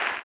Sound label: CLAP, blues & soul; ILIO; Vocal Planet